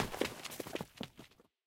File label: generic door transition